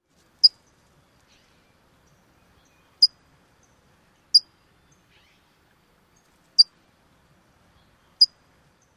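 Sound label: California Towhee calls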